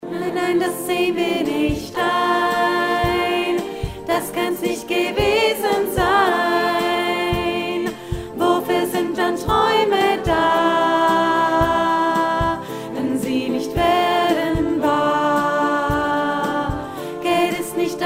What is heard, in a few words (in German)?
Lebens Ziel hohe Mittelstimme